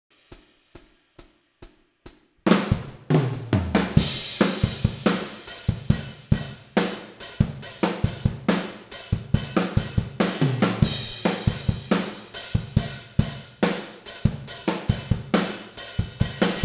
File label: Drums Dry; KI im Studio